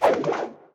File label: Wire Throw